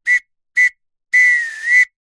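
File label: whistle two tone blow referee soccer close up; ref final; foley; zapsplat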